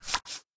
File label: move bad; YummiCookie